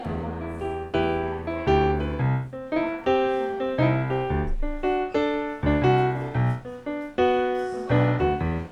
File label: piano example